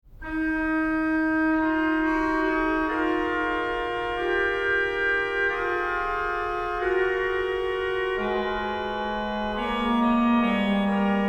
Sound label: canon